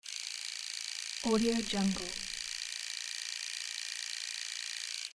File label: in built beard trimmer on electric shaver